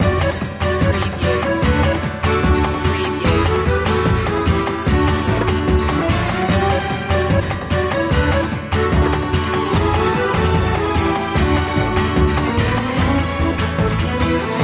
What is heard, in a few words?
circus dance loop